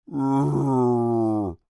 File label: Creature Moan Exhausted, Human; Groaning; Voice; Creature,Human,Moan,Exhausted; Big Room Sound